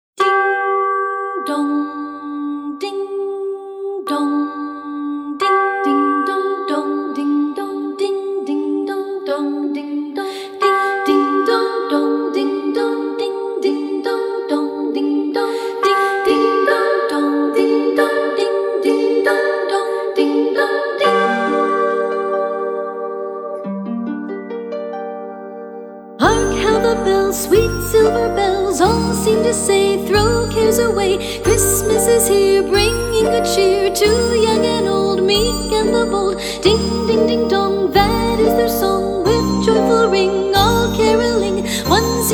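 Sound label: Christmas Orchestra, Carol of the Bells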